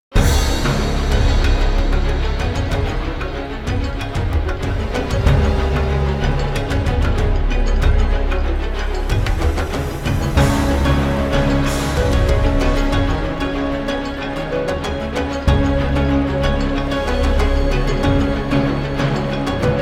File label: Combat Loop; inkwater Marsh Torch Sword